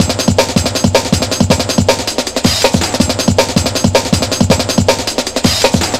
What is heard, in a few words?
160, Drum Loops, Drum & Bass; Total Drum&Bass